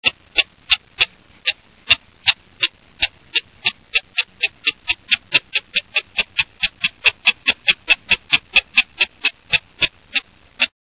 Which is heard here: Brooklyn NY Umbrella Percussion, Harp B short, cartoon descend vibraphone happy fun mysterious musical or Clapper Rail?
Clapper Rail